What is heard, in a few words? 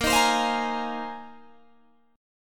A sharp 9th Suspended 4th A sharp Guitar Open G